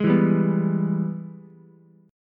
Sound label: Ab Minor Major 7th Sharp 5th chord, Ukulele; strummed; JGuitar